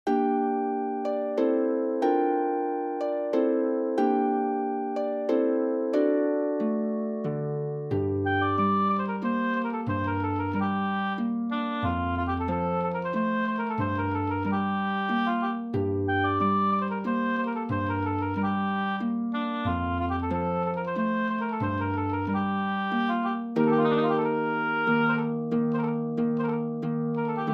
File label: Oboe and Harp; Two Miniatures for Oboe and Harp